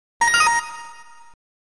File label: Credit Insertion